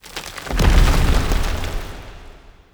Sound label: Shrine Temple Door Open